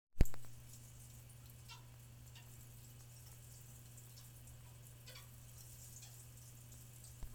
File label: boiler